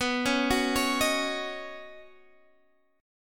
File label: Db 7th Suspended 2nd Suspended 4th B, chord shape